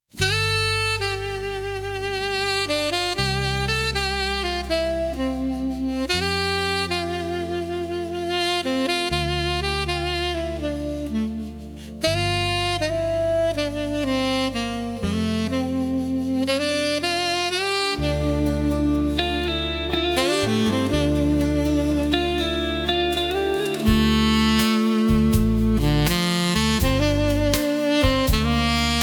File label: sax and soul